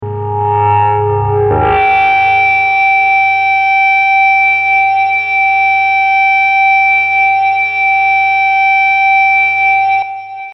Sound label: feedback